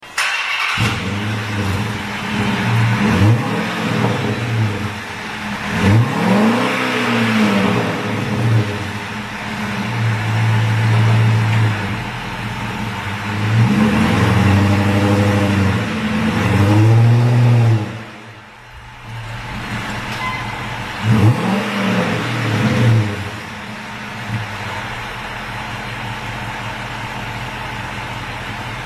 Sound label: Charger2 Turbos